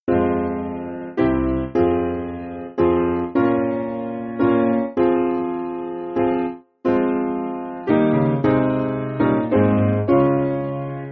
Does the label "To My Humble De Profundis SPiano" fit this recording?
Yes